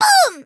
hit; Cookie Run

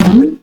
jump; agar agar; cookie run

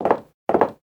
loading

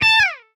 slide; cookie run

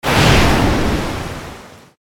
Ship Out; Salt

